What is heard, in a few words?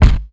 mid heavy kick sample g sharp key; kick drum samples